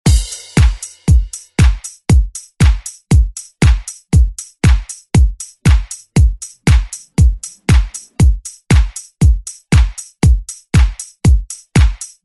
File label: 90s ReDrum; DJ Mhark